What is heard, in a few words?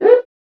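percussion quica; Northstar - Drumscapes Roland; Bonus Perc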